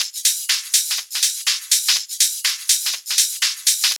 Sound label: house loop